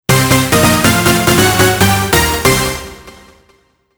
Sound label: jingle